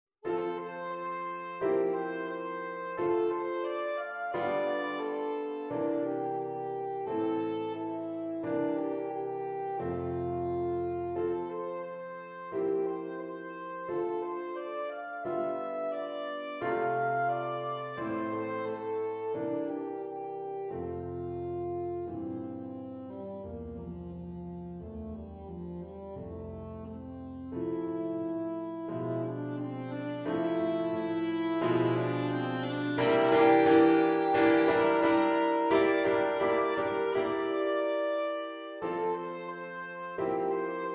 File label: sax and Piano; alto saxophone; To A Wild Rose